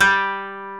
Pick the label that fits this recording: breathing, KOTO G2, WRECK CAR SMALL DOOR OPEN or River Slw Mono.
KOTO G2